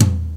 Acoustic Tom Drum One Shot A Key, tom sample tuned to the A note